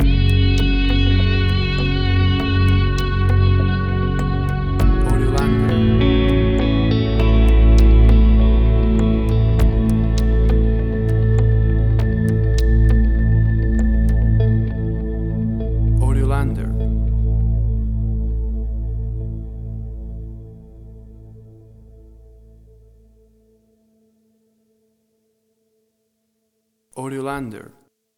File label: Ambient Electric Guitar; 15 SEC MIX; AUDIOLANDER